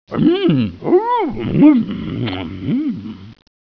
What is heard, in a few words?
Grumble